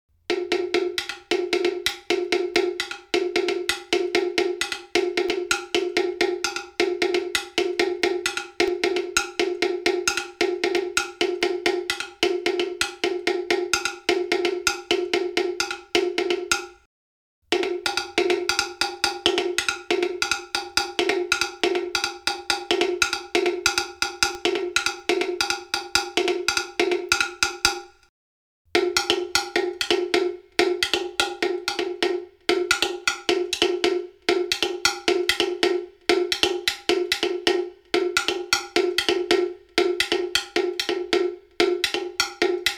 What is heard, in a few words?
Toere SAMBA Played